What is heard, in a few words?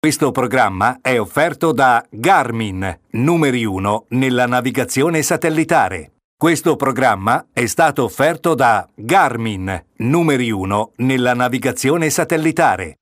Jingle; garmin